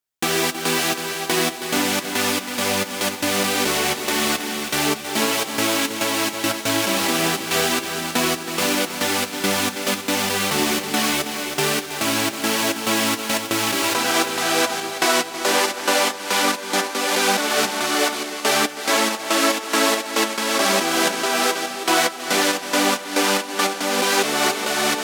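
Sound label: 140 BPM Intro Chords sidechain; Vengeance - Essential Clubsounds Vol.5; Vengeance Intro Kit; Root E; Synth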